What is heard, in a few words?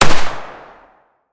tkb015 shoot, weapon; metrocraft